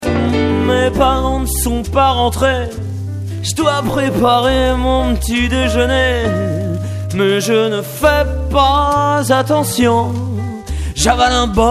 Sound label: blues